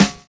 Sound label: KICKING; Univers Sons - Kitch 70s